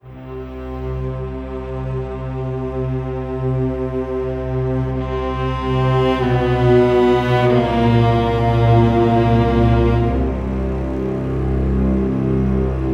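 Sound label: C minor, Low Strings, 95; PowerFX - Downtown Orchestra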